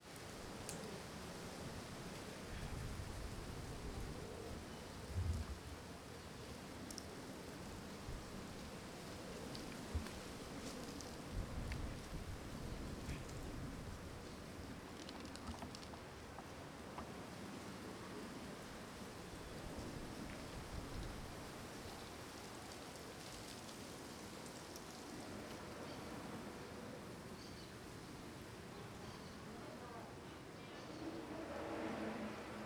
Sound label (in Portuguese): Ambiencia de Tarde em Praca do Paranoa